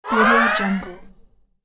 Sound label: games rising jump